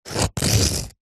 rock or stone single hard scrape cut score knife; foley; zapsplat

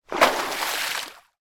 water movement fast; sound effects one

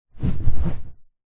vehicles car person sit in seat

